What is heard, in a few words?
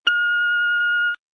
Beeps; 헐리웃특수효과음; 신호-경적